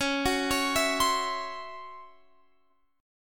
C sharp Minor Major 7th Double Flat 5th, Guitar, Open F; C#mM7bb5; arpeggiated